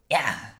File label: Voices argh